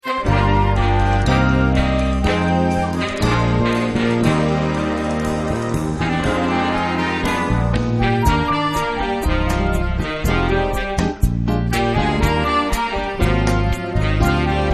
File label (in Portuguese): orquestra bossa jazz